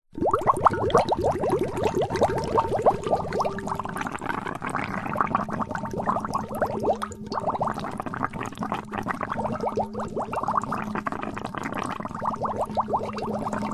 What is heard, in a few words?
foley water in metal container bubbles blow through plastic flexi tube long